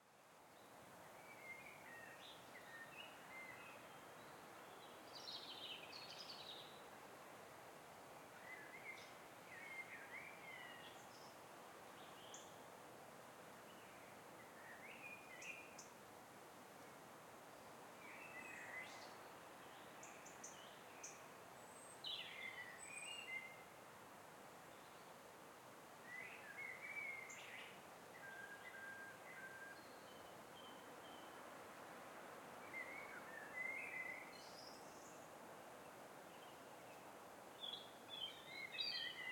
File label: wind robin blackbird, Woodland Atmosphere; Mindful Audio